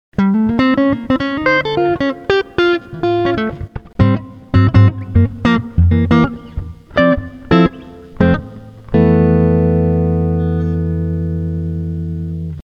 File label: jazzy tele